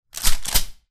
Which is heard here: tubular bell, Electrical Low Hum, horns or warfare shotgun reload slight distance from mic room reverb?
warfare shotgun reload slight distance from mic room reverb